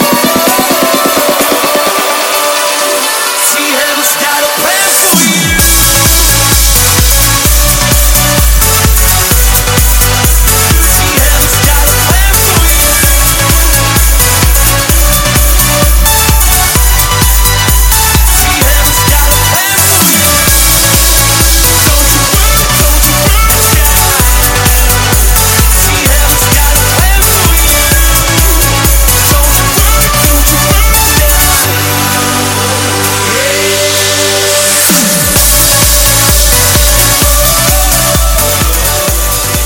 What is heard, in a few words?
129bpm G; Redrum 2.0; remixplanet